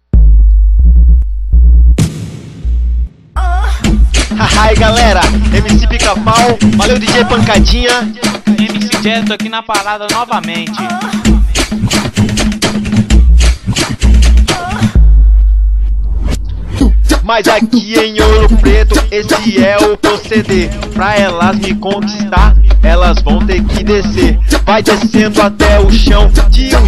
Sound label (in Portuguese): funk